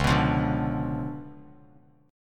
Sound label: C sharp 7th Flat 9th Bass Standard, chord; C#7b9; strummed; JGuitar